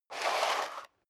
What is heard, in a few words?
step leather shoes concrete slide long; schritte